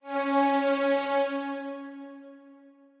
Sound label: Strings start